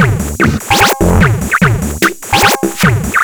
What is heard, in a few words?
Organic, Drums Beats